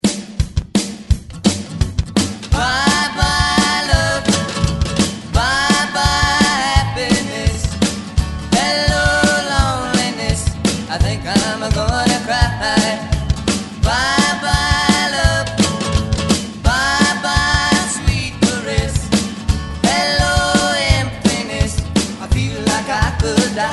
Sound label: ReDrum 86 172 Bpm